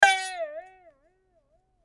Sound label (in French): pincement corde